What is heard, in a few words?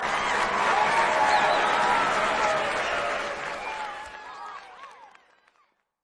crowd cheer; SoundsCrate